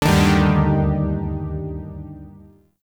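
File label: Shawzin Minor Chord E; Zariman Shawzin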